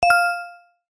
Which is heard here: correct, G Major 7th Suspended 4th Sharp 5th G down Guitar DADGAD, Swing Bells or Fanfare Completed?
correct